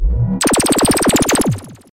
circle beam

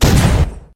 Tank cannon

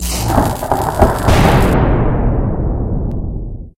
skill lightning

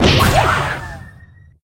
sky hit

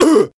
Scream

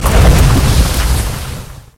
power

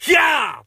kihap

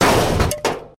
skill2 hit

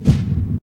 Titan run

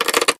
Freezing

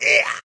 Scream

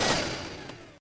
disappear

